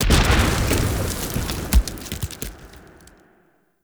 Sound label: Tree Dead Destroy; Objects; Destructible Trees